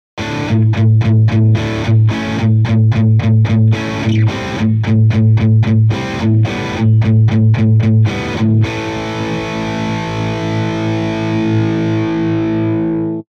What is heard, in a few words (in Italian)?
gate